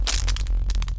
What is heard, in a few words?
Shock